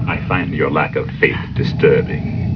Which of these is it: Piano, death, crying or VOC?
death